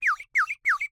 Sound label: Bird whistle, clay, painted, water, airy, wispy, rise fall, quick, 3 chirps; Eiravaein Works; Vaeyan IV